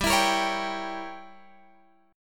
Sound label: G Diminished 7th, Guitar, Open F; Gdim7 strummed